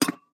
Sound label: Peg Leg Footstep